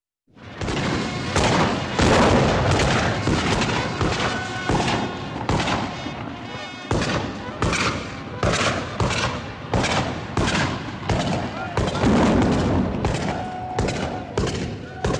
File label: Soldiers Gunfire Exchange